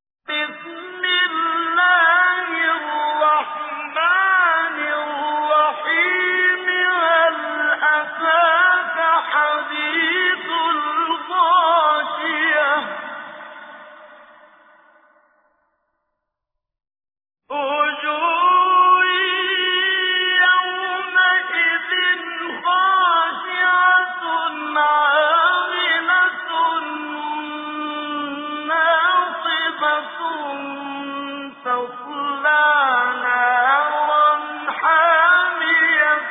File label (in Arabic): سورة الغاشية, تجويد; المقريء الشيخ محمد صديق المنشاوي